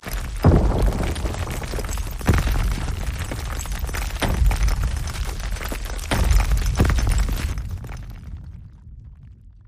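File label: megaways earthquake; aztec gold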